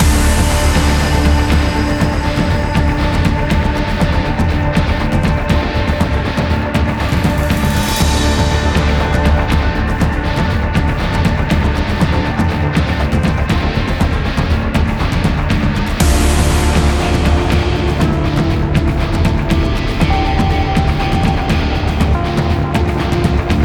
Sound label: Battle Rock